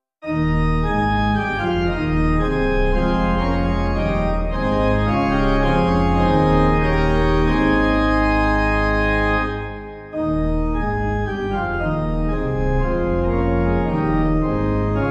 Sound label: The Day Of Resurrection Pipe